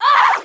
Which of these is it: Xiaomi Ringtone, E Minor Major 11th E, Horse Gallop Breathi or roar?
roar